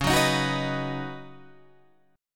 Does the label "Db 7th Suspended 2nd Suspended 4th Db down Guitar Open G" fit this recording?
Yes